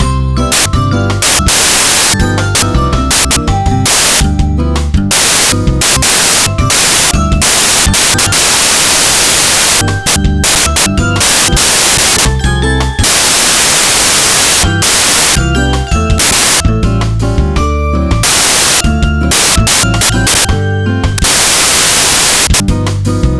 bossa, bgm; pictcode

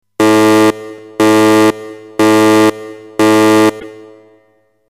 alert; pictcode

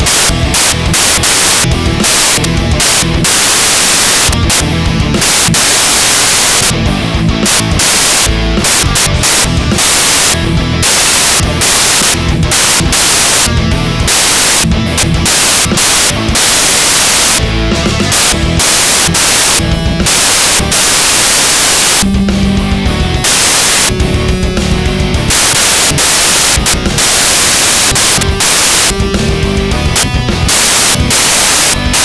rock, bgm; pictcode

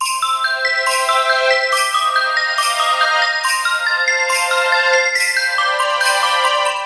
fantasy, bgm; pictcode

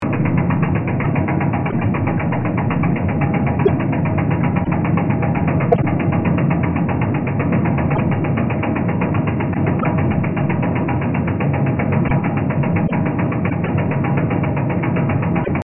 BOAT; pictcode

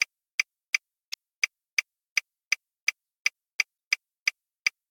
CAR; pictcode

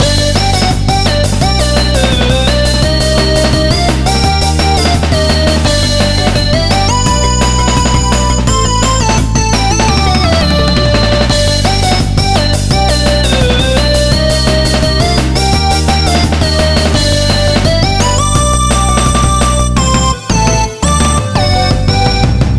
pop, bgm; pictcode

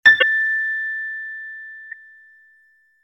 piano; pictcode

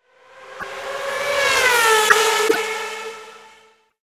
CAR; pictcode

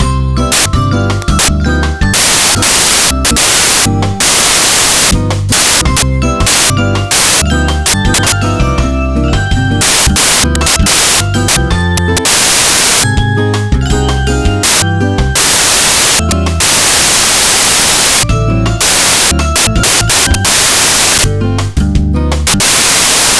bossa, bgm; pictcode

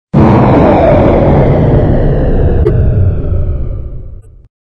attack; pictcode

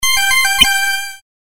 chime; pictcode

